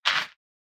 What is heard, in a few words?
footfall gravel step; soundscrate